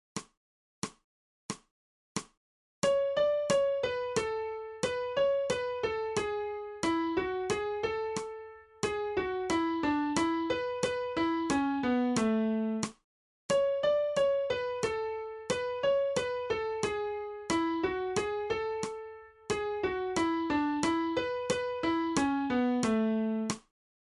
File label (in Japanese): 新フレットレス